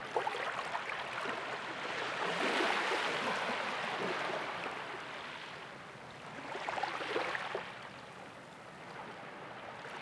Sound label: Beach Loop; UltimateFishing2020